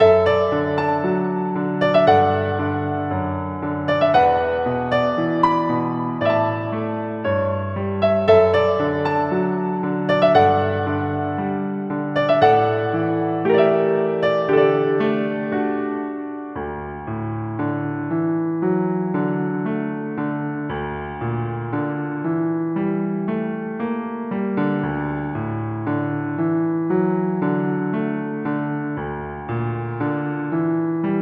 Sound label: Solo Piano, BPM058, LOOP; The key to home; 家的钥匙